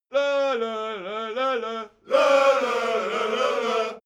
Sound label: crowd musical bawling